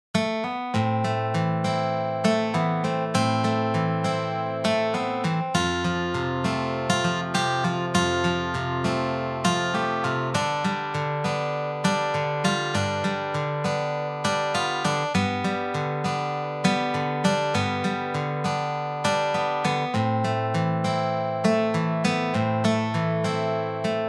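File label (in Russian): гитара грусть фоновый звук; film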